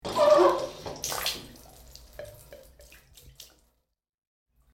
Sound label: Creaky Water Faucet Squeaks And Drips; Sink